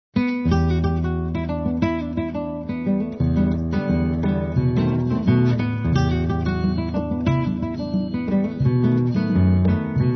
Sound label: Choro